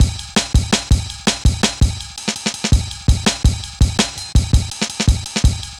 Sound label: Jazz Ride, Drum Loops, 165.5; Jungle; Zero-G Jungle Warfare 1, 2 & 3; Jazz Ride B; 90 s Sample CDs